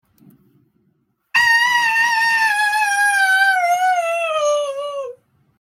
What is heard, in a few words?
mom scream; bobbys mom